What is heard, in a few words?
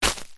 footstep single shoe wood chippings pile